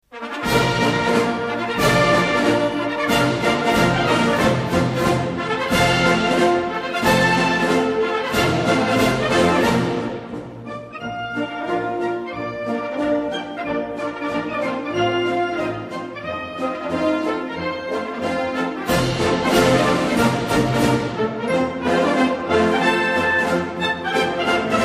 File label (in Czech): Hymna